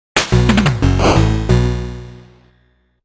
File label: INSERT COIN; DARIUS ALPHA; Darius Cozmic Collection